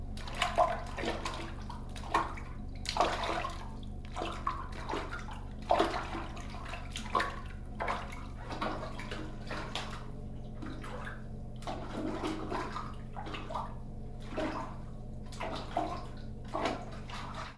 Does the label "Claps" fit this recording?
No